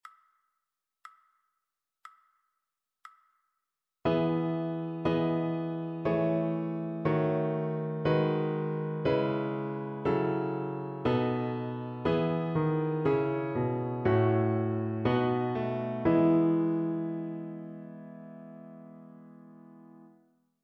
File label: harmonic dictation